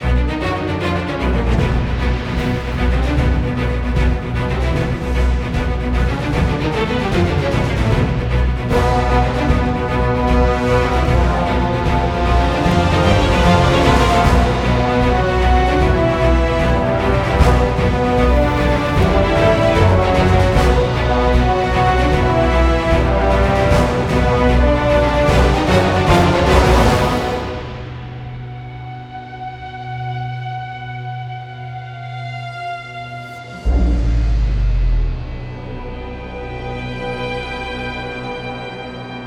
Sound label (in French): orchestre; MUSIQUES CHRONIQUES